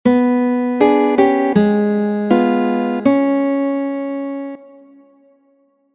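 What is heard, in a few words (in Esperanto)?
Studo pri akordoj, ligo kaj arpeĝo; La unua frazo aperas ĉe la baso, kiam ĝi imitas ĉelon. la centra sekcio portas tiun saman melodion per soprano, kiun subtenas arpeĝo ĉe mezaj kordoj.; Studo 8-a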